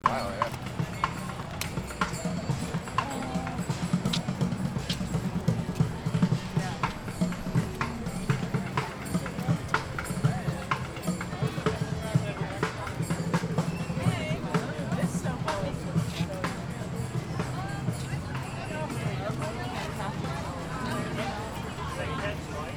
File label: Saturday Market; Portland OR